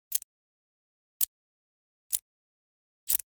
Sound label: Generic Click Metal Tiny